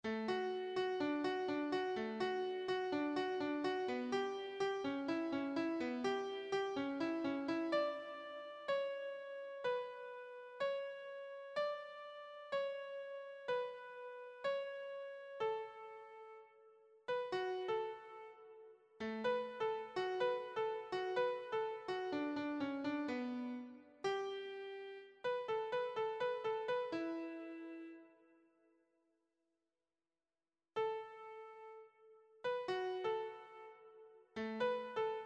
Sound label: lead sheet, piano